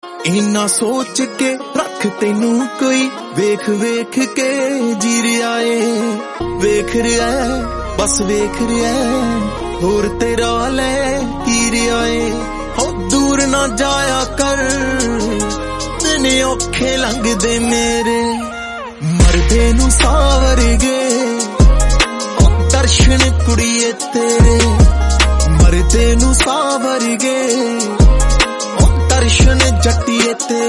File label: punjabi